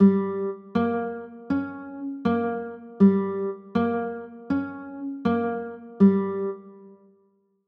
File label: g dim, chords